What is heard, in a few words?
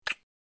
Kisses; Quick Peck With Light Lip Smack